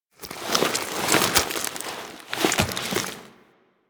Alchemy Lab Chair Foley Stand; Warframe; Hub; Liset Alchemy Lab

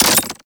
Melee Impact Glass; Warframe; Weapons

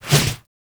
Wolf Paw Swipe; Animals

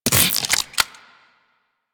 Idle, Weapons; DE; Warframe; Sentient; Shedu

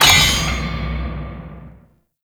Abyss Resource Drop; Warframe; Abyss Of Dagath

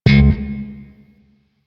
Shawzin Slap Oct Two C Sharp, Chords; Music; Warframe; Sentient Shawzin

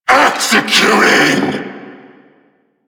Vocal Attack; Warframe; Duviri; Dax; Vocal Set A

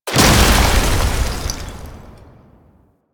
Alchemy Grenade Explosion Cold; Warframe; Entrati

